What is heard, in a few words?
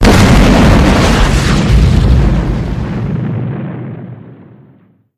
nuke; nuke landing sound